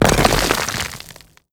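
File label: impact stone pillar debris; Explosions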